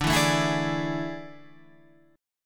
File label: A Major 7th Suspended 4th D